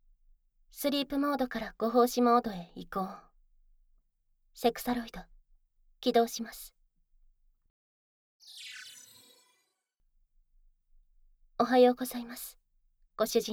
アップデート 耳奥舐め

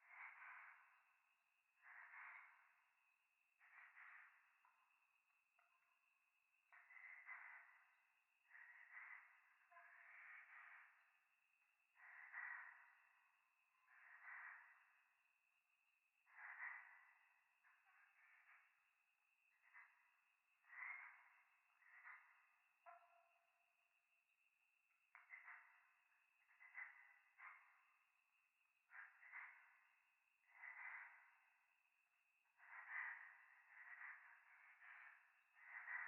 教会 左耳密着吐息 山奥 夜, ASMR

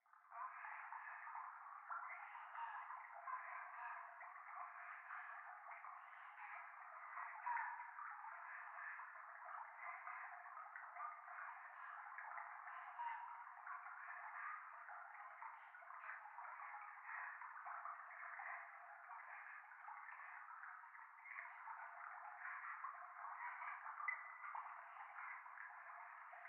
教会 右耳密着処女喘ぎ 川のせせらぎ

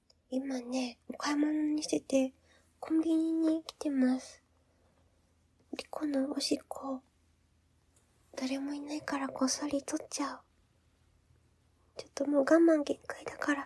おしっこ 実演 コンビニ の トイレ で おしっこ; コンビニのトイレでおしっこ編

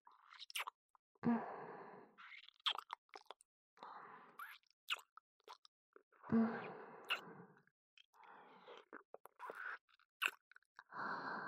甘キスループ

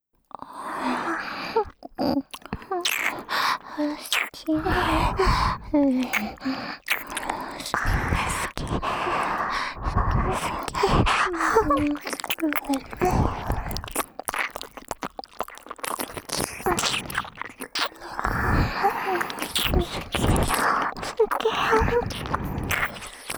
溺愛メイドのＷ好き好き連呼＆耳舐め, ASMR

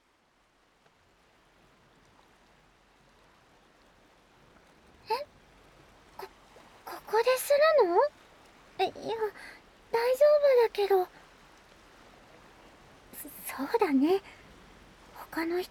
口フェラ／ねっとり／波打ち際; フェラ素材

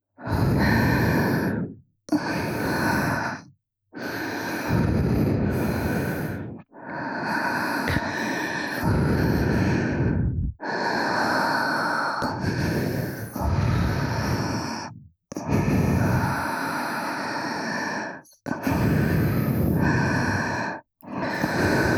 密着吐息

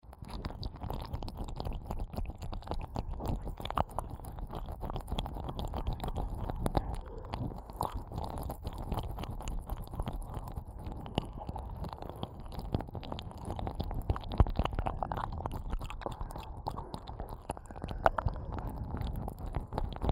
舌先れろれろ両耳舐めループ; 耳元オホ声、耳舐めループ; オナサポ

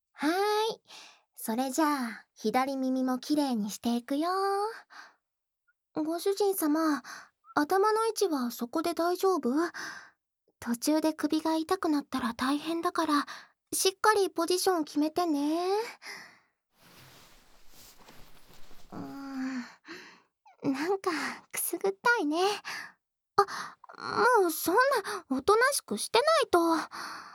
耳かきするよ、ご主人様！（左）

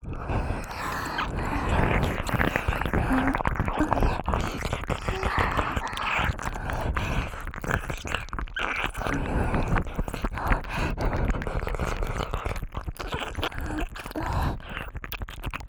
両耳れろれろ舐め; おまけループ（オナサポ）; 耳元プレイ

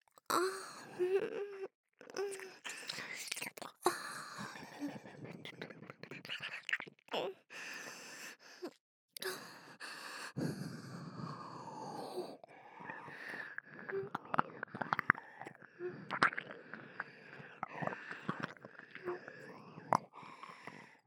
左耳舐め, ASMR

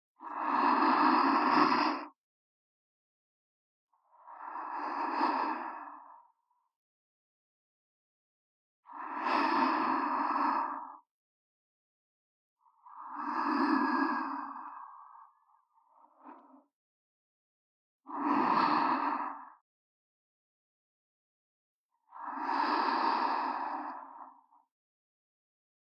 寝息(左) (ループ); メイド様; SEあり

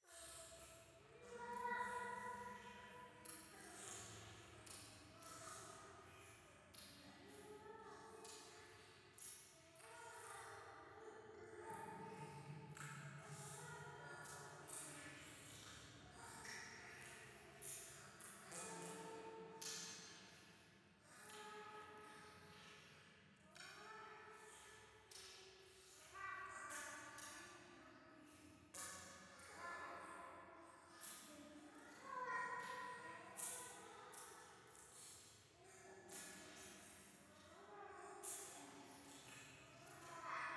廃墟 イラマチオ

